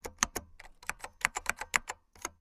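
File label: computer keystrokes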